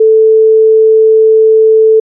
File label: fm sine